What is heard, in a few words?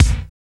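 BD, bass drum; X-Static Goldmine 1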